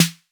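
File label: 808 snare drum; Wizoo - Platinum 24 Electronic; CHR SD 808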